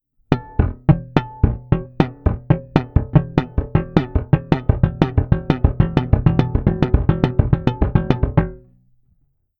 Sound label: Triplets; fun slap exercise